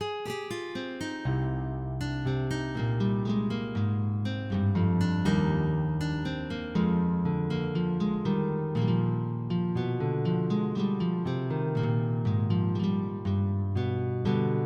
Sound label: Courante; de Mouton